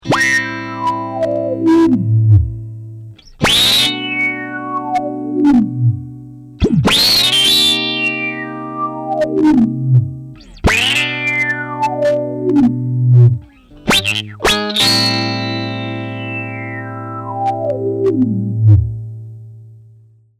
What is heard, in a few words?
Chord Zipping Tube Zipper